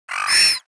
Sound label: seagull; World of Tanks Blitz; ambient milbase